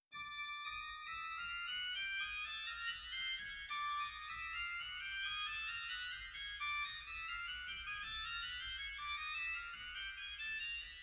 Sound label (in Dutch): Rugwerk Scherp, zwolle